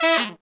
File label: Pop & Funk Brass, TRP TEN FX; Best Service ProSamples vol.25; AKAI